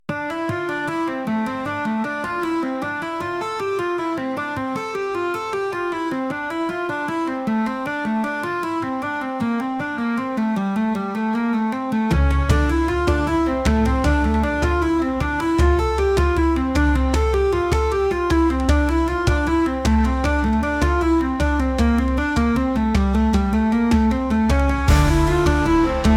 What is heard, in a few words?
calm